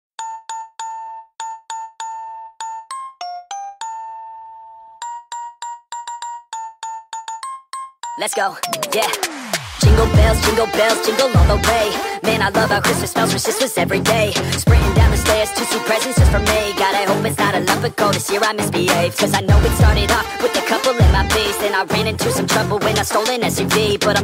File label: Nightcore Jingle Bells